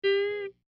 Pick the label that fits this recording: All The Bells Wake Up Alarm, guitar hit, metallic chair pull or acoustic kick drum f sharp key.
guitar hit